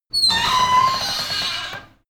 metal door screak thriller open

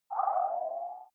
game start dull

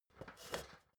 step ladder metal scrape single

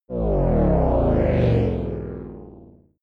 break down

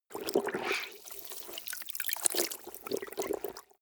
clothes water wring medium

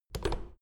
door plastic handle pull down creak

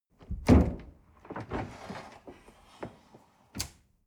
dishwasher door open

